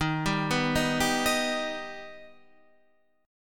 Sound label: D sharp Augmented, Guitar, Standard; arpeggiated; 11,10,9,8,8,11; JGuitar